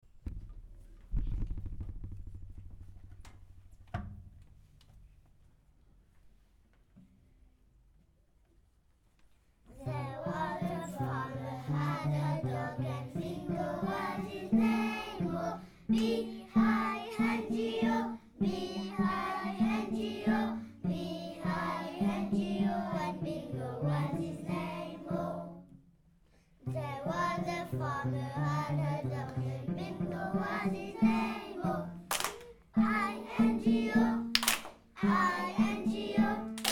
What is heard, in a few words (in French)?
BINGO entier avec Cello